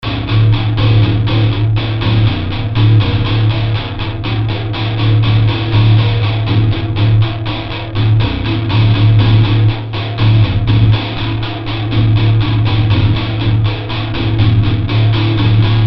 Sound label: chugga loop